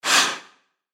hockey stop

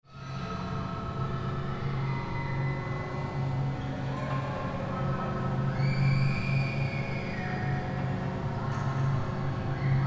screams distant

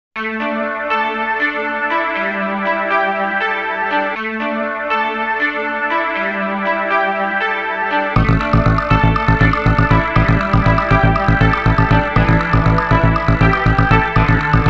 Grooveloop; Soundzabound

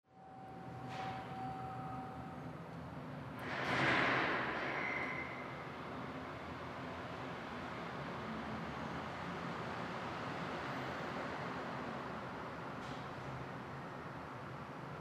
parking deck

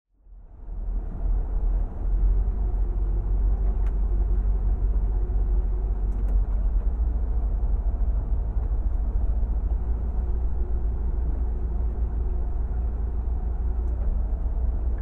Yacht Captains Quarters Ambient

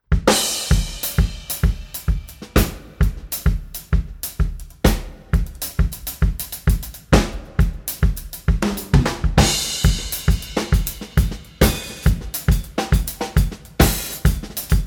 Drum Set Duet

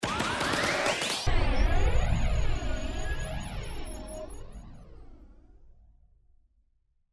Blast Stutter

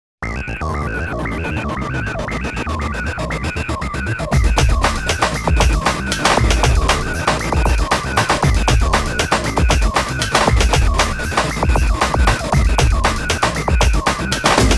Industrial Kick; Soundzabound Volume 3

